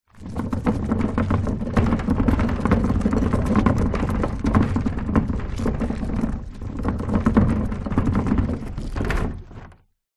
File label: bin plastic wheelie pull over stoney ground; household